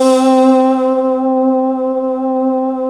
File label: CLOUDS C3, VOXY; Best Service Alpha Dance vol.2